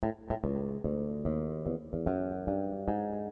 bass; The Interactive Alphabet

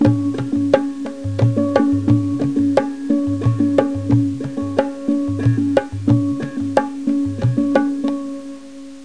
help loop; The Complete Work of Mahatma Gandhi-CD interactive

